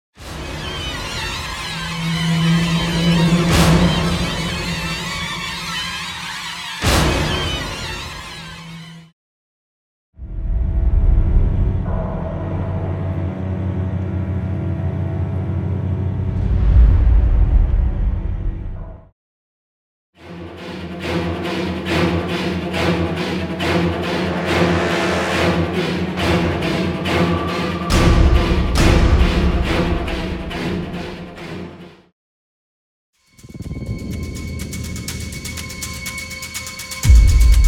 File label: Paranormal Horror Music, Combat Danger; Paranormal Horror Music Bundle